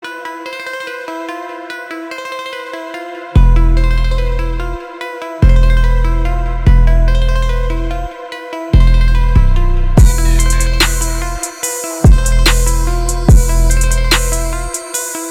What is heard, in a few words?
hookah instrumental